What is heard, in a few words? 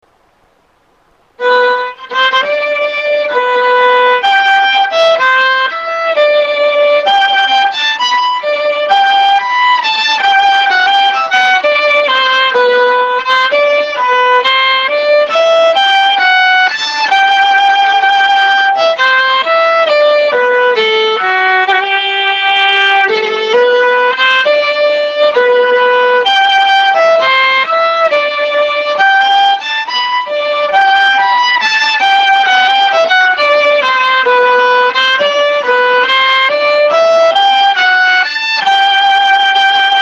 Hambo Slow